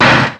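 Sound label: Collision Exterior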